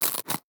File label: carrot consumed